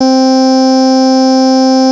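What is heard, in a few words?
electric piano, SQ80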